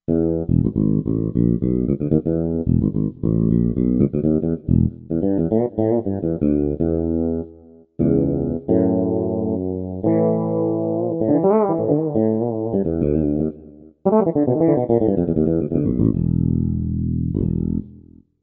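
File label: Lead, bass; JacoLeadF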